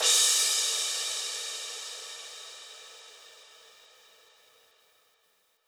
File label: Crash 140BPM, Drums & Perc; Gminor; Vengeance Samples; VTS1 Devastating Truth Kit